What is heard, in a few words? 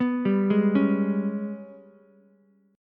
Ab Minor Major 7th Double Flat 5th Ab, Ukulele; arpeggiated; JGuitar